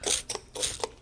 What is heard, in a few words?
crunchy twostep, 117 bpm, drum loops and breaks